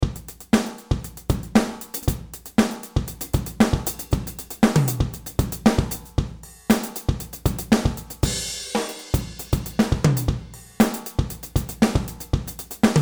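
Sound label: Funk DLX Sonor Designer, drum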